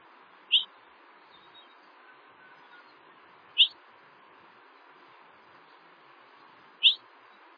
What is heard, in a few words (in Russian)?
korotkij svist s dlinnim pererivom